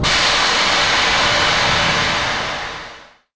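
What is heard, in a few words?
Emergency Brake, Train; OpenBVE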